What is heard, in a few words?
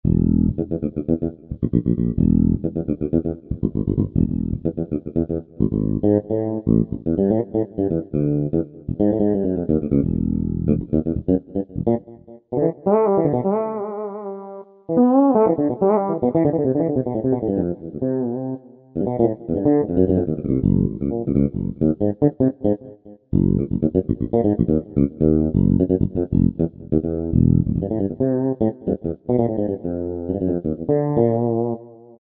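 Bass Lead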